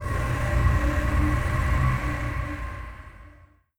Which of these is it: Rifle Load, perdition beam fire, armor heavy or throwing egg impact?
perdition beam fire